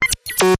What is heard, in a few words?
Chirpy Buzz